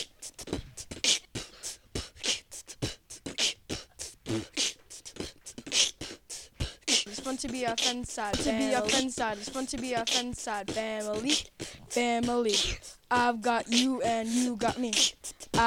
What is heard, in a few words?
Song Beatbox